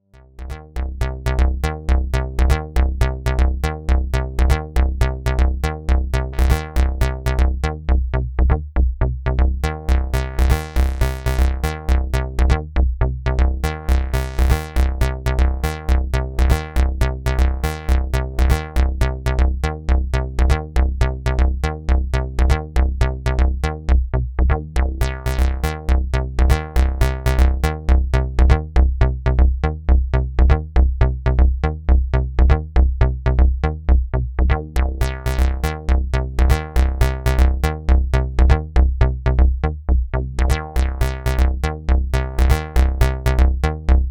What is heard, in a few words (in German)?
Roland Gaia2 Bass Sequenz